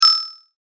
multimedia rollover, Multimedia Rollover Tone

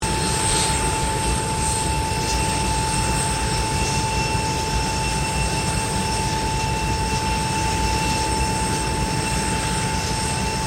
AIRCRAFT BOEING 747-400 JUMBO IDLE CLOSE LOOP; Airplane Sound Effects

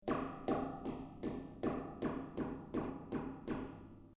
METAL CABLE STRIKE SEQUENCE; Materials

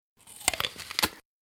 Biting Apple, Human biting into a crunchy apple.; Eating Sound Effects; Lynne Music

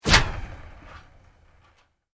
Sweep Mechanical Fast; Created sweeps, swooshes and other movement effects; Sweeper; Sweepers (Sound Effects:Sound Design); SoundEffects-Foundation4

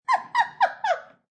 Female Giggle, Female giggles.; Laughter Sound Effects; Voice

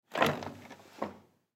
Copy Machine Open Top, Toshiba 2060; Copy Machines; Electronics; Office; Blastwave

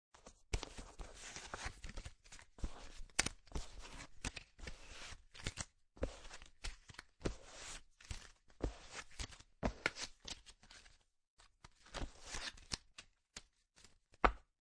Cards Playing Cards Collecting From Table; Card Sound Effects; Playing cards, poker, collect cards from table; Cards (Sound Effects:Casinos)

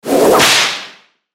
Whip Crack Bullwhip Impact Snap Reverb; Whoosh; Whipping; Warfare; Blastwave

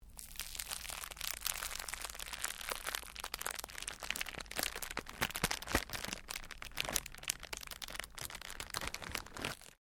Cellophane crinkle

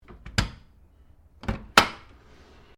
Dishwasher Door Open, Household dishwasher; Dishlex; Appliance Sound Effects

